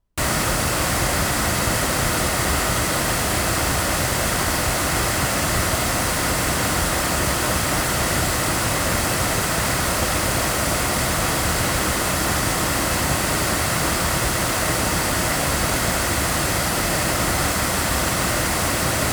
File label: loudspeakers; Pearl CB22; microphones